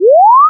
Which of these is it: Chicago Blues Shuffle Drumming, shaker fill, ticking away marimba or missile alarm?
missile alarm